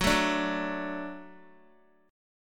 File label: Gb Minor Sharp 5th, Guitar; strummed; x,9,9,7,6,x; 4F,4A,5C,5G,5C,6E; JGuitar